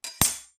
warrior block hit; action RPG game; Adventures in Lestoria; Sig Productions

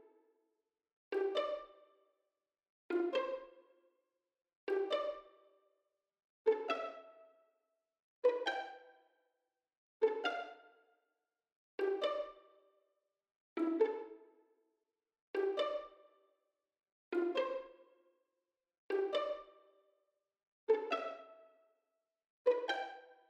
pizzicato; Adventures in Lestoria

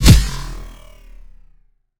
warrior sonic slash; Adventures in Lestoria; action RPG game; Sig Productions

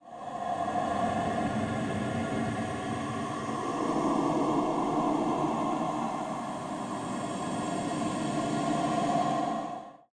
phase transition; Adventures in Lestoria; action RPG game; Sig Productions

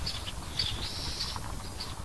birds; Adventures in Lestoria

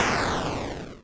Explosions

